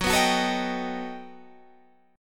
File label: G Augmented Major 9th